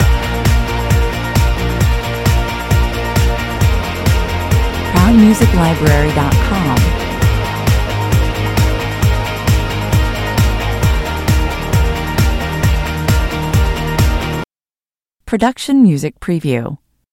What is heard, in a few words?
fast car loop